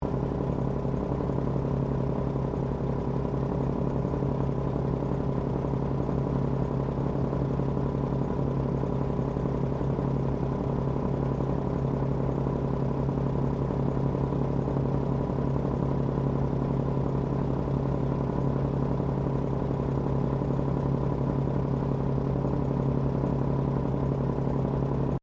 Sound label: Train Diesel X Class 1951 Tasmanian Idle Underside Loop; TGR; Bellarine Tourist railway vehicles; Trains